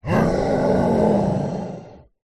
horror monster growl wide stereo; zapsplat

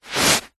foley hand on carpet single short rub; zapsplat

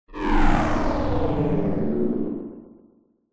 science fiction machine shut down power off

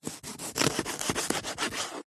food lemon slice cut

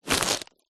present gift wrapped pick up grab

